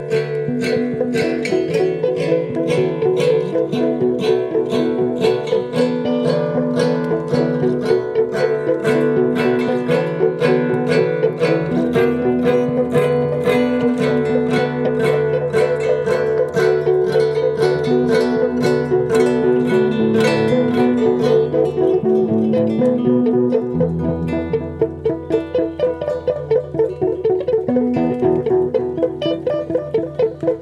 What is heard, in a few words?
tea in the guitara